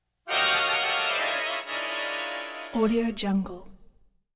accordion; prosoundfx

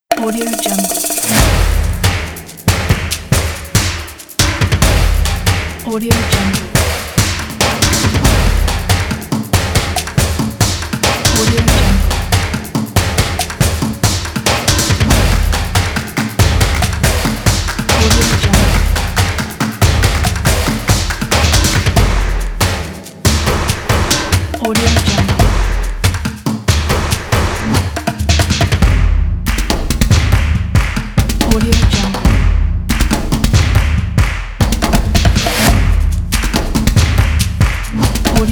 percussive upbeat; audious

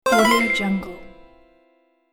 pyramid game bonus

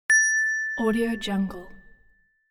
ding

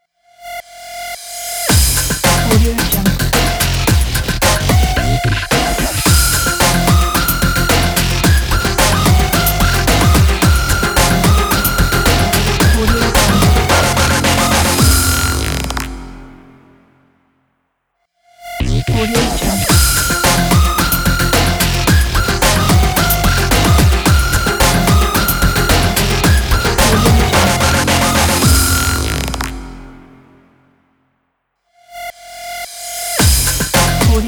dubstep breaks logo

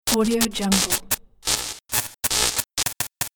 neon sign flickering

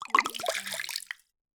water bottle spill

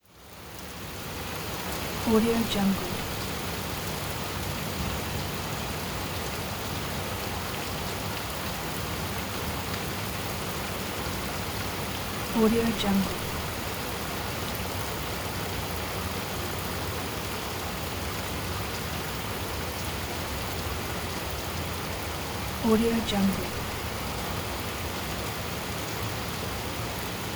rain

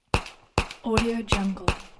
pistol shots

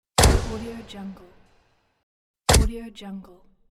arena lights

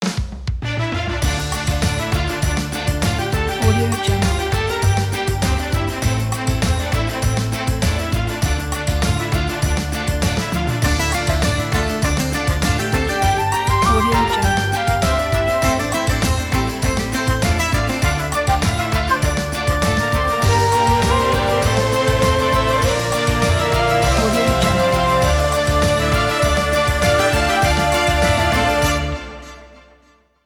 turkish aegean trailer

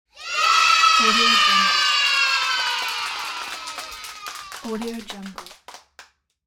children clapping yelling yay

